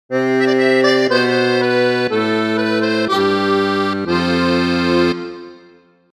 impro accordeon; loto sonore

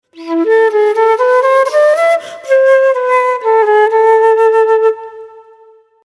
impro flute traversiere; loto sonore

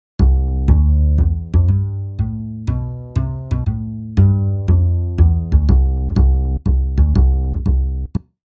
impro contrebasse; loto sonore

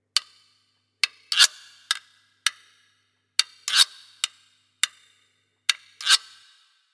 impro guiro; loto sonore

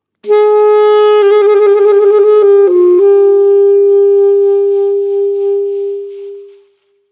impro saxophone; loto sonore

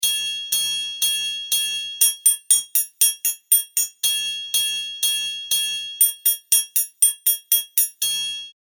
impro triangle; loto sonore

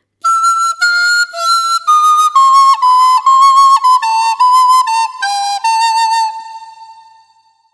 impro flute a bec; loto sonore

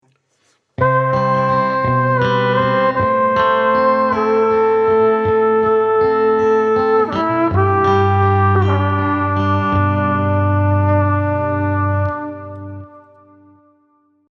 duo trompette et guitare electrique; loto sonore

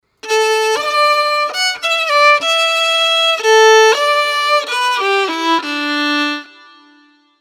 impro violon; pour les petits; loto sonore

